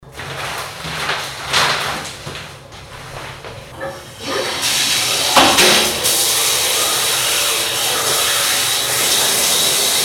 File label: shower